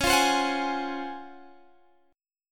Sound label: C sharp Major 11th C sharp, Guitar Standard; C#M11 strummed; x,16,15,17,19,16; JGuitar